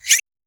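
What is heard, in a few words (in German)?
flinker dolchstoß leichtes, blunt impact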